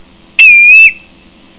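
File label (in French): pluvier argente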